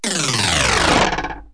d zip glis, cartoon accents; Kaboom! Junior

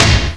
Short Slam; Prometheus 1.0

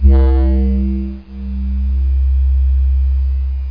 mellow synth lo; OctaMED SoundStudio V1; jazzies samples

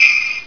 Pixie Dust; PowerPete Demo

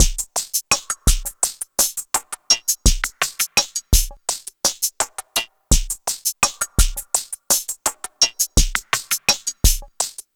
Packet, downtempo beats

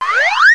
going up

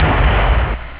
dzing; OctaMED SoundStudio V1

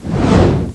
whoosh; Bugs Bannis; Squish 2.6.8

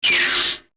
bw zap; I Love Spelling

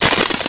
PLASTICS

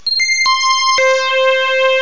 Horn Hi; instruments

